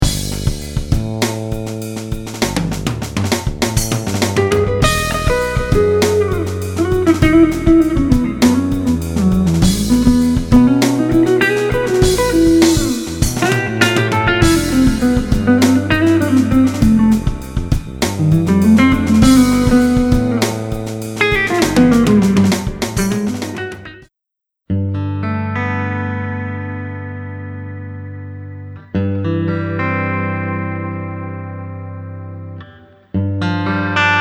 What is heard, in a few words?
sound check